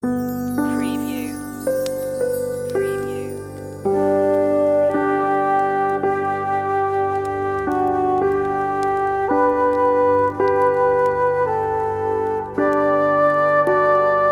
Hark the Herald Angels Relaxing Christmas, on-hold music; MediaMusicNow